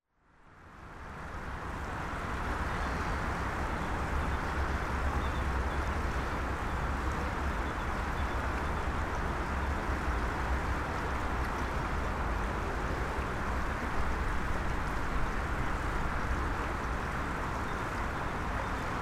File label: River Lea Bow Locks